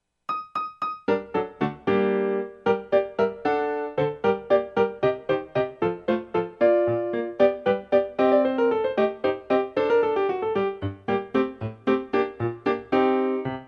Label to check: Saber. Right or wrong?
Wrong